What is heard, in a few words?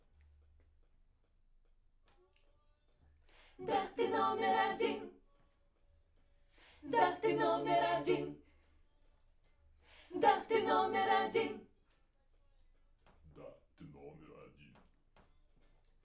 Backs; SCHOEPS